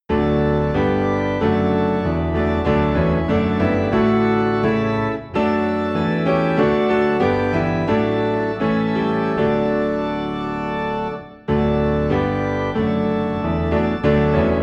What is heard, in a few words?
Piano and Organ Low in D; Guide Me O Though Great Jehovah